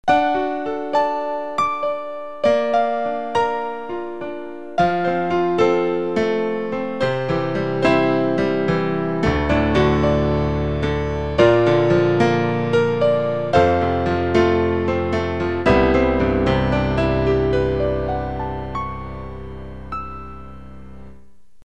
Piano, Yamaha TG55; Factory Presets

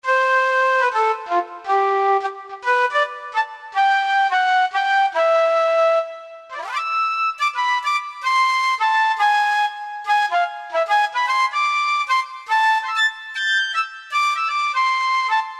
Hard Flute, Korg T3

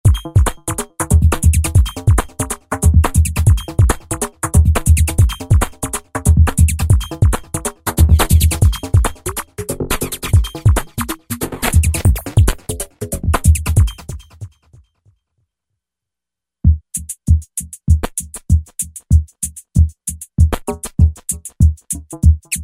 Hi-Tech KIT, Roland V-Synth; Factory Patches

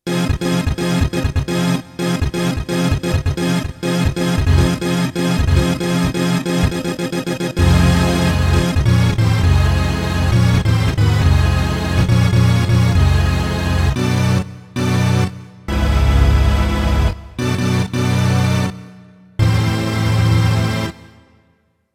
RAVE SHIFT, Roland SC-880; Factory Patches

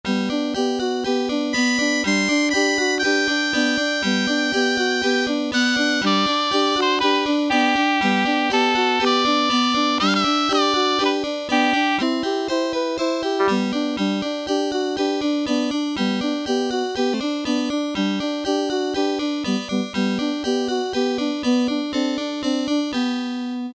BAROQUE, Yamaha SHS-10 Factory style demos

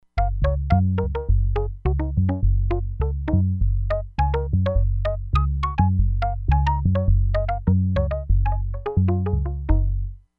MALLET HARMONICS - KNOCK ON WOOD, Crumar Bit 99; Factory Programs

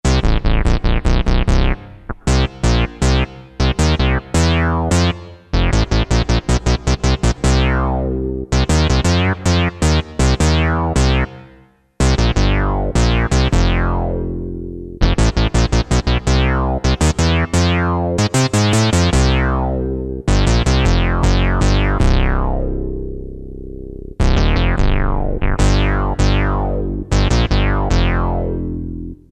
FUNK BASS; Akai SG01v

